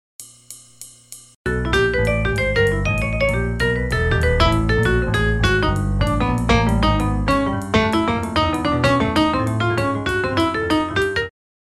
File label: 8 bars; tp aratori vol 1